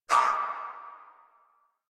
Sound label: Pain Crewmen, Battle Barks; Dialog; Warframe; Corpus Crewmen; Crewman C